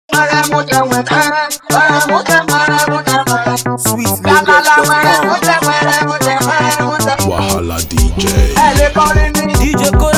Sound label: Dance Beat